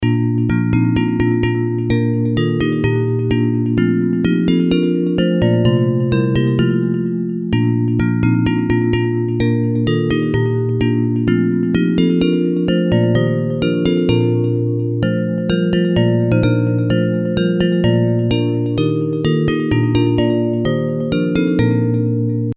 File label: Bells